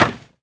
hammer

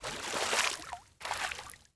wash

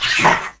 attack; mahlendur

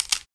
STEP; mahlendur

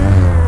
HURT; mahlendur

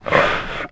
snore in

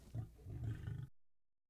SLEEP

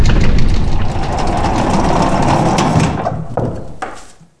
ropeway end

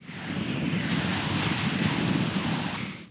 WIND FIST LOOP

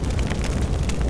burn

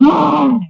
die

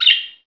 bird

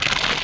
grind

